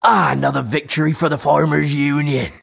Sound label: victory; Pitch Black Audio Pong